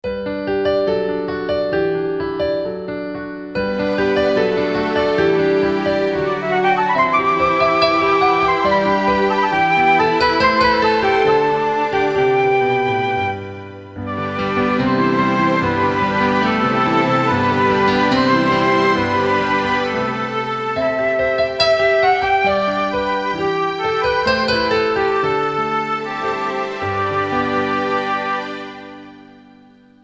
Jam

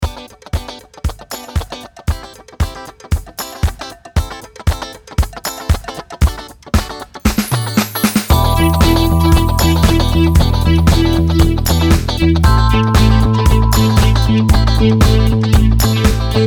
VOCODER

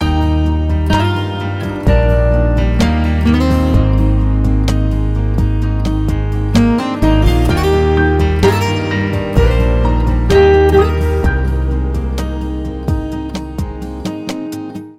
Light flow Guitar

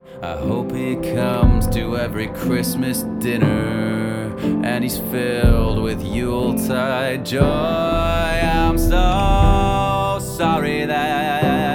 Singing Hanging Up the Phone Big Loud

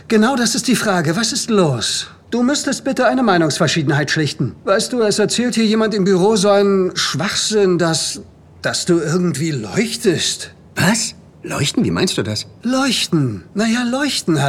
90.00 BPM; LOFT VOICES

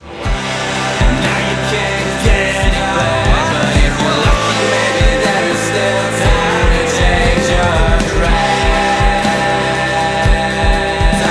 Singing 35 Down Screaming Harmonies

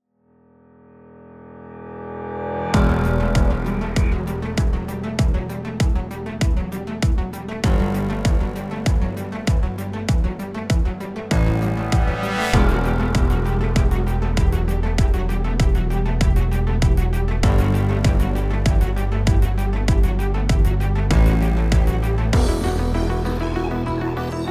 start the engine

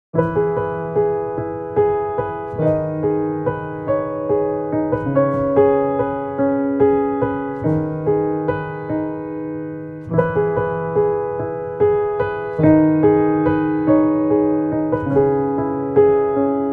Piano Theme

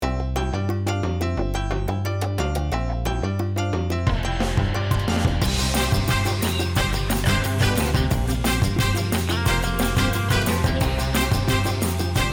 latin rock

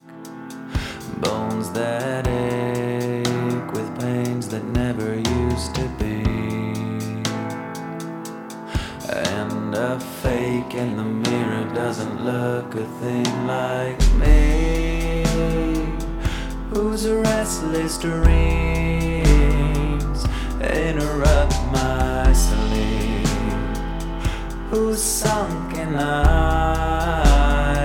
Singing Down Soft

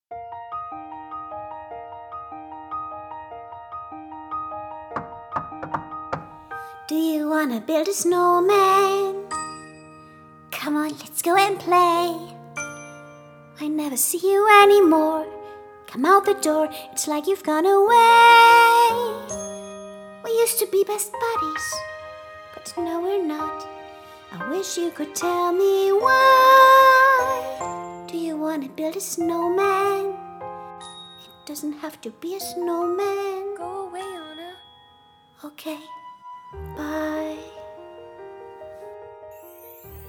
song snowman en child; LOFT VOICES

